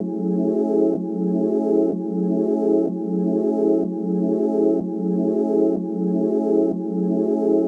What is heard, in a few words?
Pad Loop 125, Loungin House; Equipped Music